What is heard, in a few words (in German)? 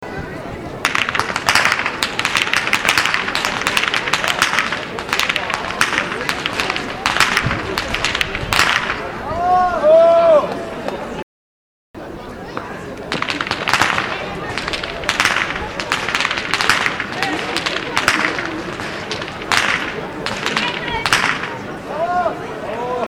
schnalzen, jugend